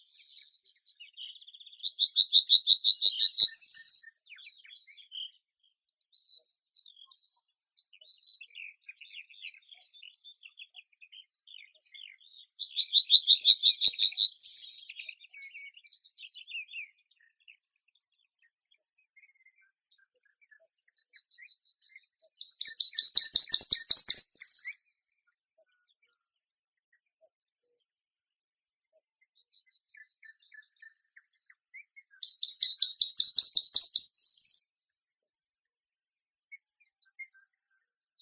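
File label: yellowhammer, Emberiza citrinella; ovsyanka obiknovennaya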